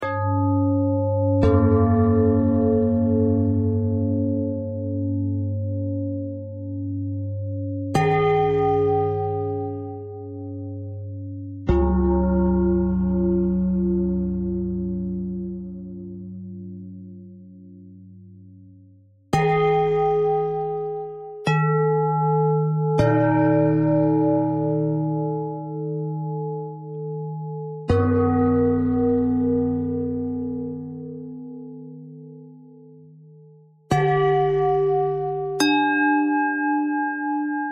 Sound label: bell bend threads